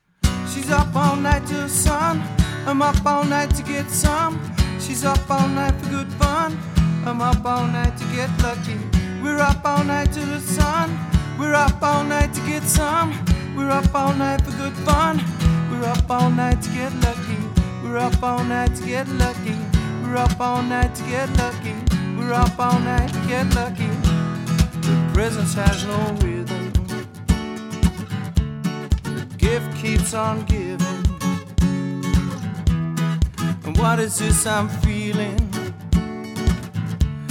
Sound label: Solo Acoustic; Guitar Vocal